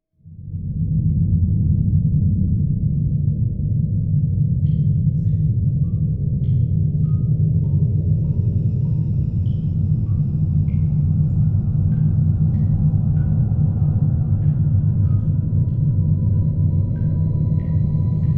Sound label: Drone Texture; Macbeth Castle